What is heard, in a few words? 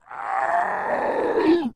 zombie voice